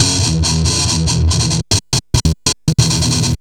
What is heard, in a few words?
Treat Guit, Industrial Nasty